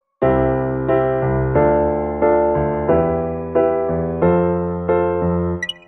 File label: Chord 7